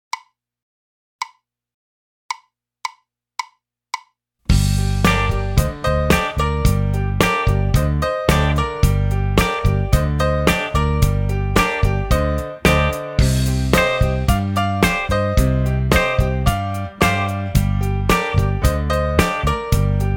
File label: Blues Based Minor